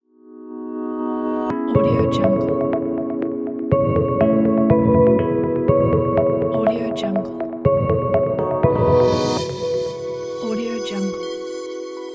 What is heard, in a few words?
retro romantic logo